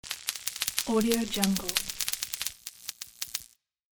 electrical transition with a bang

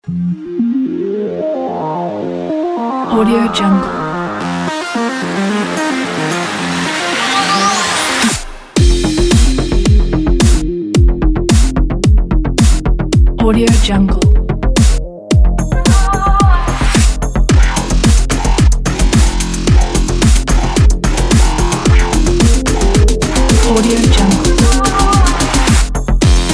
sport energetic techno upbeat; sweetmusicproduction

